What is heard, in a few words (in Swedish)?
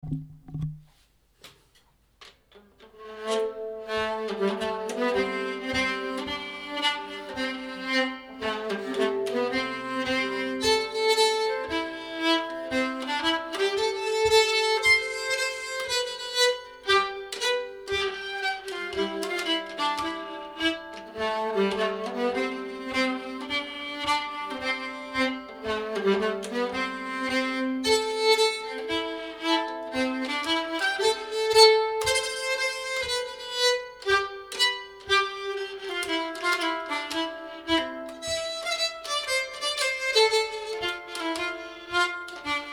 Morfars schottis stämma